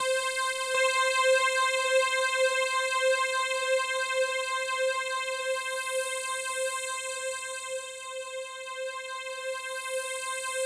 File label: C5, Synth Line; 90 s Sample CDs; NiceBeats; SynthLine VOL-2